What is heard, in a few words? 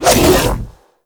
Hammer Shield Throw Whoosh B; Crp Hammer Shield